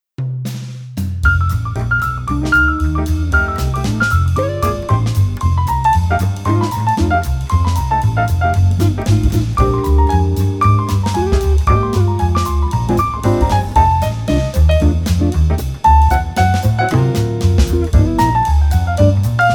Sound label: Bumble Bee Race Instrumental